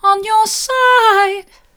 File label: mid, vocal